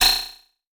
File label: Multimedia Internet CD-Rom Flash Ding